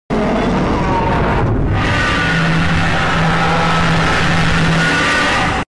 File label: speaker hurt screech; titan